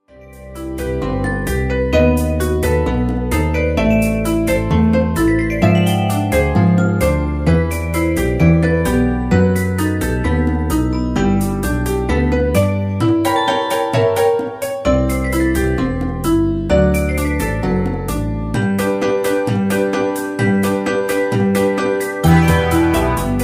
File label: SAMBA